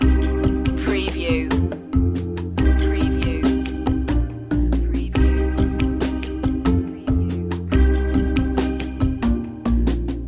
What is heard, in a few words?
tech acoustics loop